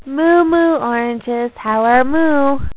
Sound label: moo